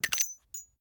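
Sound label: charge reload shell eject, pistol; gmod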